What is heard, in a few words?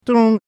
making an apple airpod connecting sound